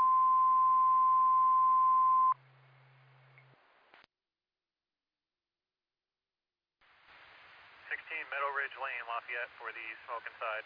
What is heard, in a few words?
Siren; Lafayette FD